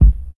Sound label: warm sounding reggae kickdrum sound c sharp key